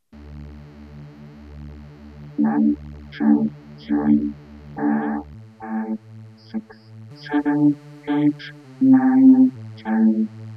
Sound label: Vocorder; Count 1 to 10 spoken; synthese input: drone sound, signals mixed